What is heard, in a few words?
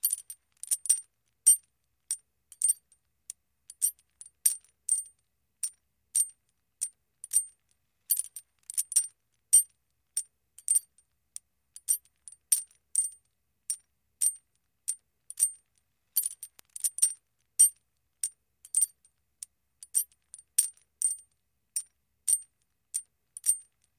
Heavy Chain Movement